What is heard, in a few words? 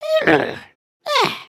trip, emotes